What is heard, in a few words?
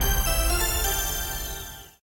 Power Off; LG; Optimus-Vu-F100S-NewFW